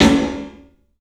CLUB SN VRB, 3D Drums; 3D Sound

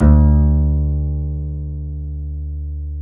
D 3, Bass; Spectrasonics; Bass Legends

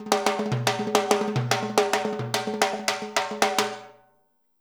FILL, Percussion; InVision Interactive; Percussion Slam

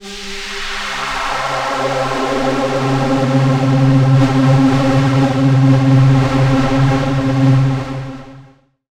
Pads; Jungle

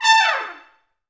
Pop Brass; Best Service; ProSamples vol.19; AKAI

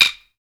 Sticks; SB Sticks

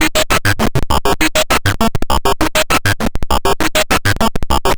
Modular Loop, 100BPM

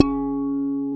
GUI HRMFLA, Acoustic, Roland

